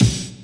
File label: Kick